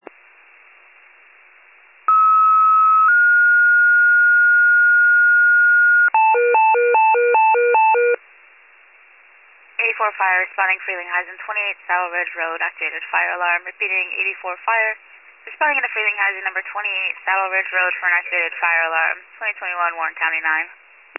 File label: FIRE pager alert